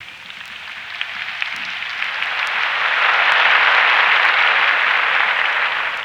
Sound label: ATMO L, Drum & Bass 160 BPM; Ueberschall; Drum & Bass Flash Trackz